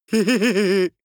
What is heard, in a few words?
laughter stupid; vocals; comedy-cartoon; soundpool